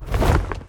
wing small; smite; god cthulhu

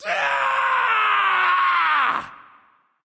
battle cry; smite; loki

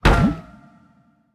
umbrella run impact; smite; vamana

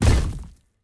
atlas footsteps; smite; god atlas

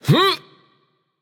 grunt lift; smite; achilles

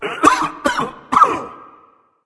nv clear throat; smite; voicepack; mercury

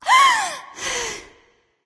nv breath; smite; awilix

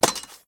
sword grab; smite; god arthur

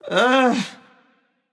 ugh; smite; voicepack; yemoja

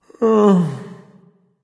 sigh; smite

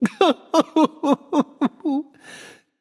boohoo; smite; voicepack; osiris

